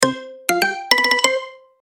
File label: Woodpecker, notificaciones; LG; tonos cortos para notificaciones